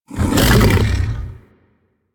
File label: Predasite Vocal Attack Short; Warframe; Hunting Critters